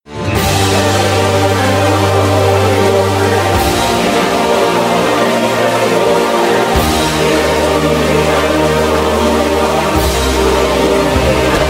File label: intro music; freak fortress 2; overlord wingvoid